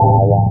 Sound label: spring; r3 the art of rocketry